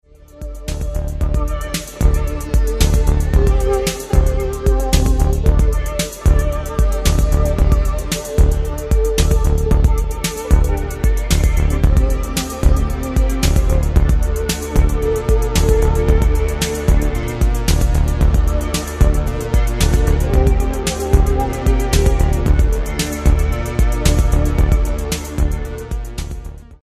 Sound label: Blues